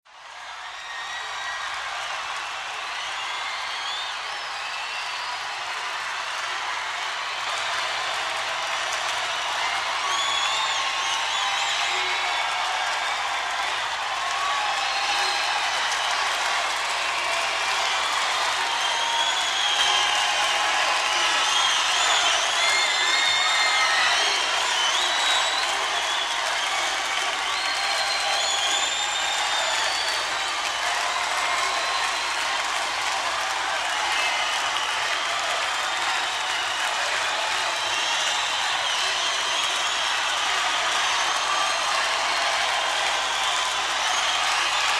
Rock Concert Pre Conc, concert

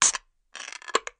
Can Soft Drink Open, eating drinking

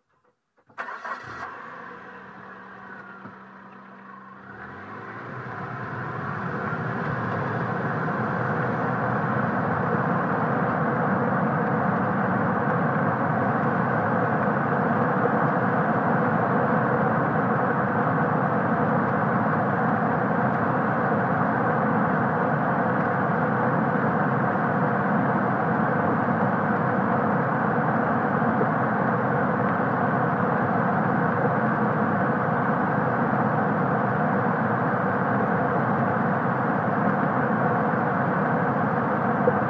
Sm Car Eng Accele, compact

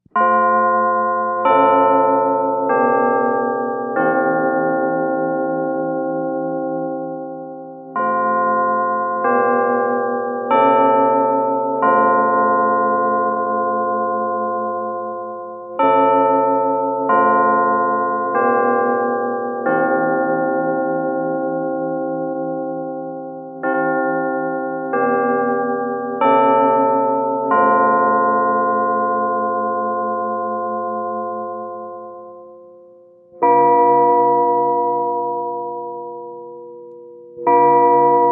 Lg Westminster Clock, bell towers

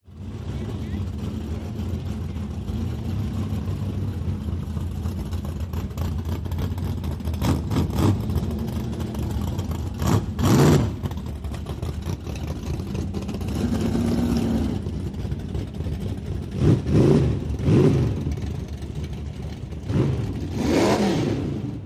drag race idle rev, dragster

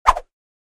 Air Swish Double

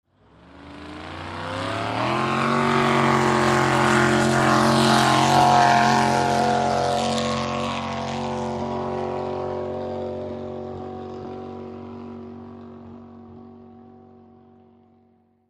Drag Boat Start revs

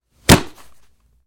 Football Catches

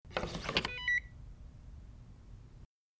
Swipe Key Card Alarm; hi-tech-various

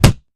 Impact Heavy Rubber

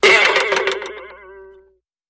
Boing Box Sharp Vib

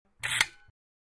Relay Buzz Click Fas, buzz

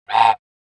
Goose Honk Typical

